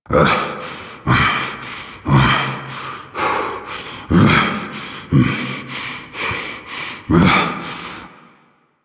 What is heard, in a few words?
Breath Loop; Kahl Veilbreak Ability; Warframe; Quests; Veilbreaker; Kahl Abilities